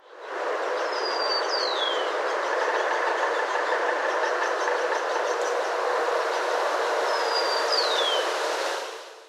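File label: Rufous Collared Sparrow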